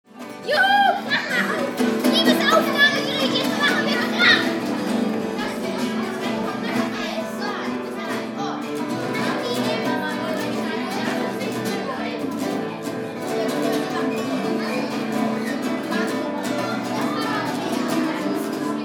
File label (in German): krach